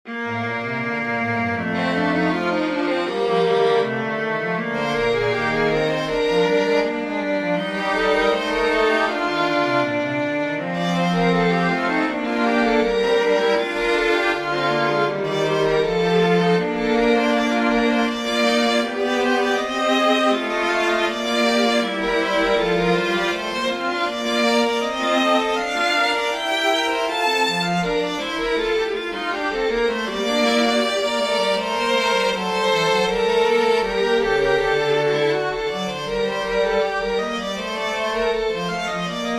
chorale for string trio; bach johann sebastian; alle menschen ssen sterben

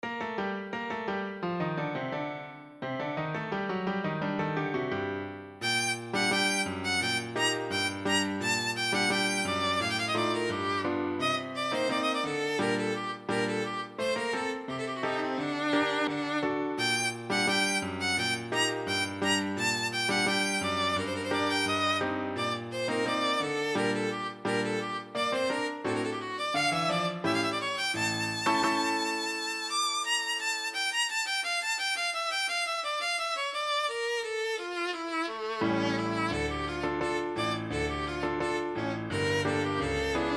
gypsy dance; fantaisie kelvin

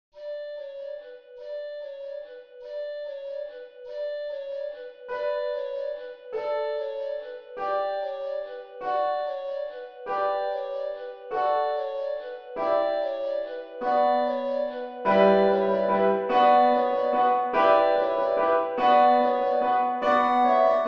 carols of the bells; leontovich mykola

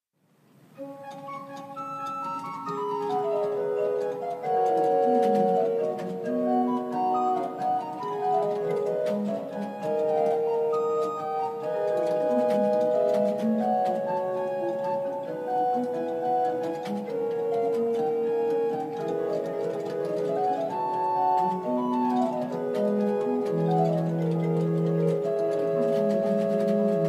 sonata; del buono gioan pietro; 1657c; sopra ave maris stella

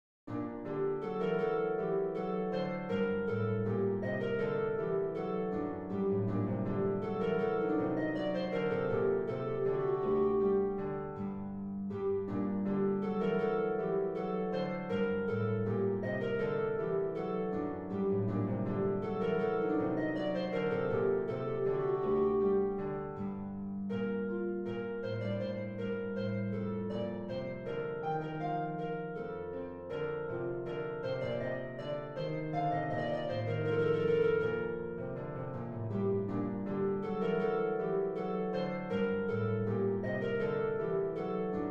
rigaudon

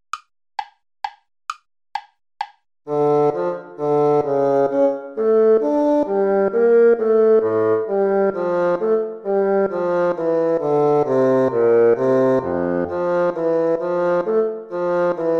minuet

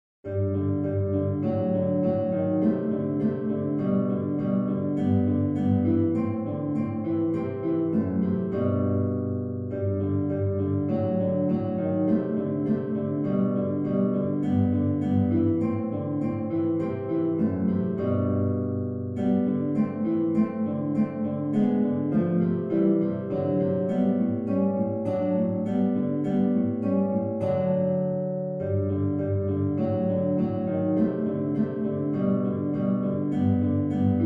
etude major; lewis alastair

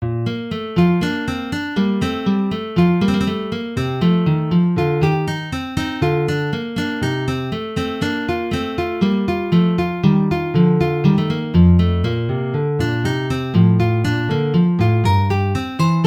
sonatina, flat major

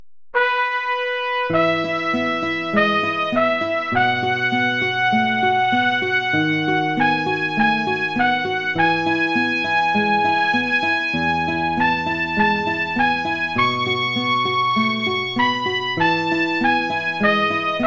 etude major

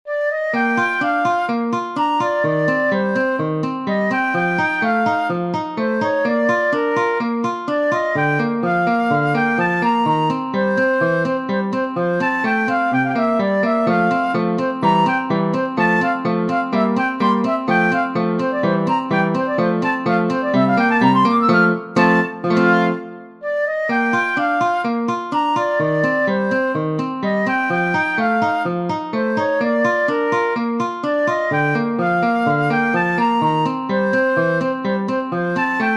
minuet major for flute guitar; diabelli anton

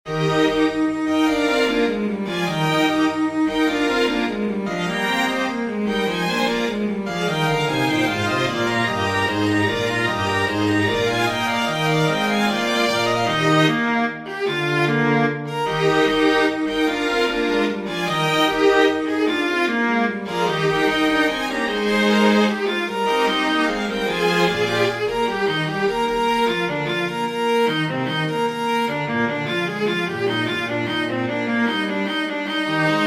aria, for viola, strings; L'empio sleale indegno; Giulio Cesare

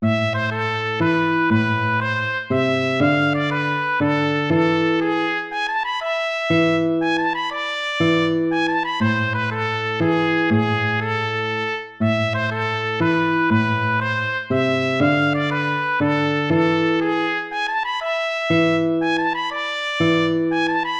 baroque minuet minor

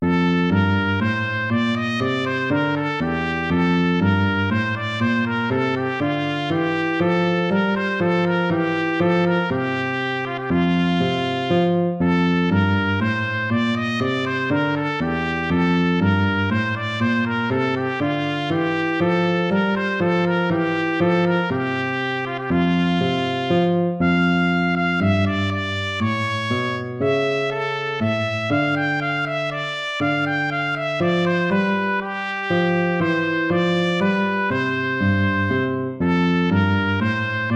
baroque minuet major

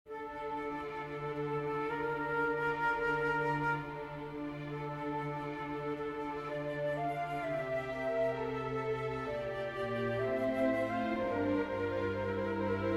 for flute strings; Domine Deus rex coelestis

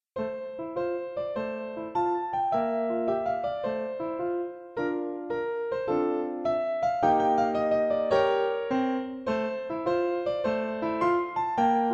song for piano hands; from children album

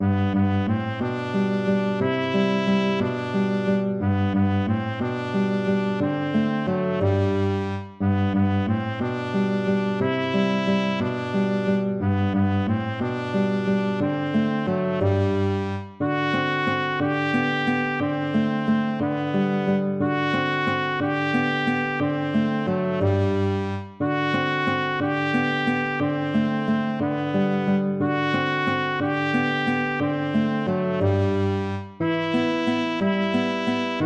waltz; Carulli Ferdinando

